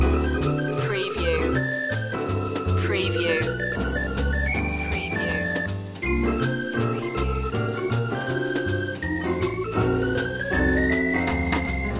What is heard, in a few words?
jazzy room loop